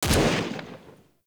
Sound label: Fire Shot, Weapons; Warframe; Braton